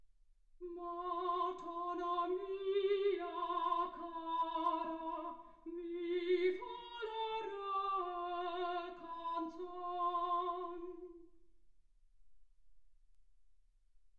soprano

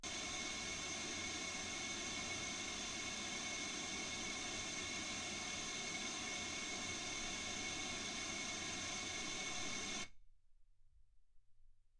wgn

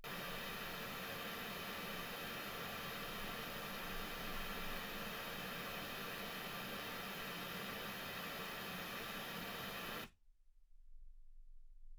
pink noise 48kHz

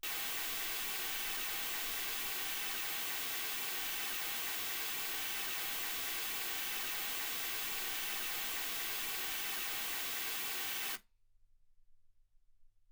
mls 48kHz

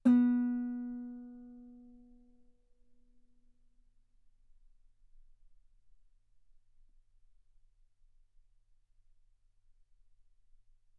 Guitar ff B3; sul B

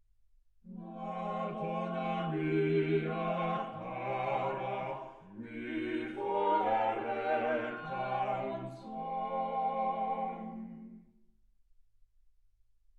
quartet

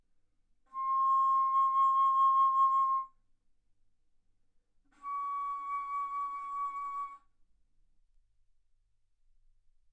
Bass Flute C6 Db6; ff

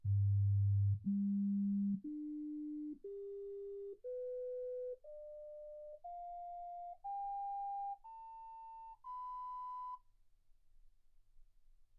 sinus tones 48kHz

